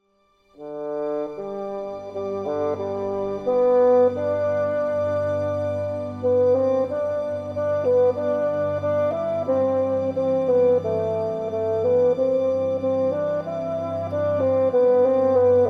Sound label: Walking Tune